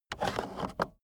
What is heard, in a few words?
car accessories cup holder push in fast; autos; verkehr transport